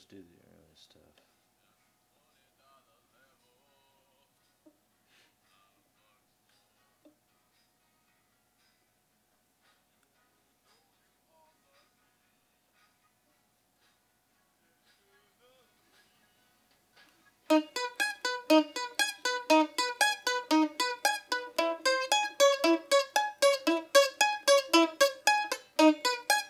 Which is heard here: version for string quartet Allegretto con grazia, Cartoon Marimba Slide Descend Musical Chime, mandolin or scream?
mandolin